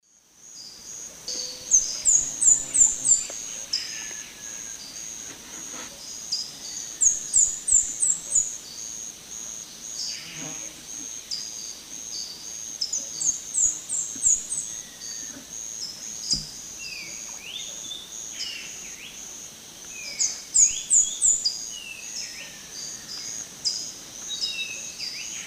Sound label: Cranioleuca gutturata, song; S. America; Eastern Lowlands